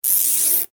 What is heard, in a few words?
Industry Cable Tie Zip; Factories; Backgrounds